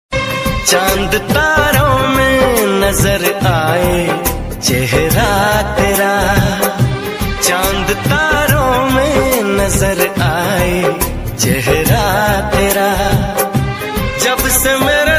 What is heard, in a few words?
90 s song ringtone old song ringtone hindi song ringtone